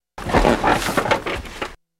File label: Bed Squeak Hard Down; bed movement